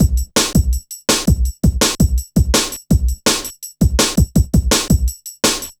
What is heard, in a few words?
Programmed Stepz, Jungle Drum Loops; Zero-G Jungle Warfare 1, 2 & 3; 90 s Sample CDs